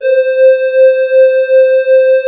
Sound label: lead C4, wavesynth; InVision Interactive; Lightware VOL-1; Instruments & Percussions